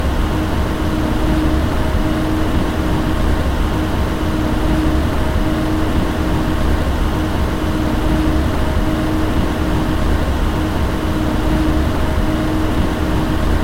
high speed fan

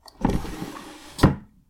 chest drawer close

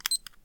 stopwatch

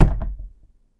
knock on Fusuma; knocking

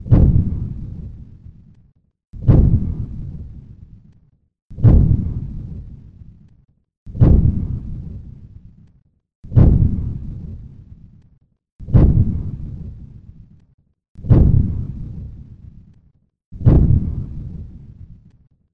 monster heartbeats

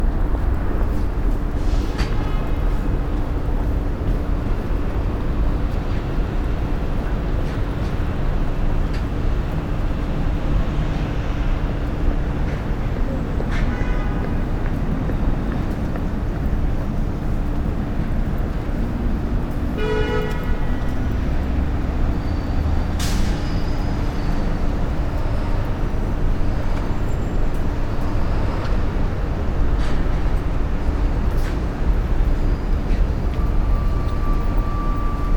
street

